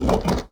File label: Spike Trap Reveal